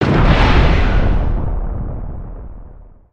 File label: distant blast off; nimbus station